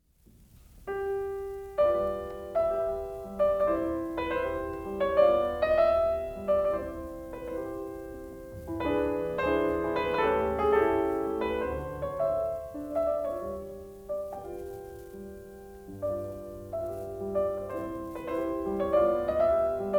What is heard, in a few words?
Mazurka; Chopin; Kapell; The collection of mazurkas